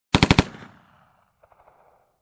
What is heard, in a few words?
Machine gun shooting short